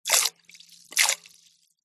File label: human spit water out into metal bowl short small amount